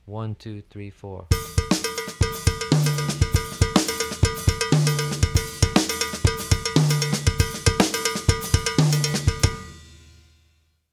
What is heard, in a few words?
mozambique pattern